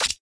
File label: secondary button press